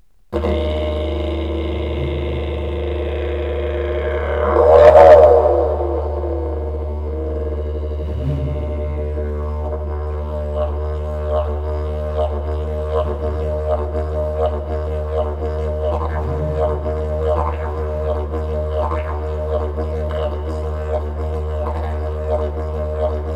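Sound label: didgeridoo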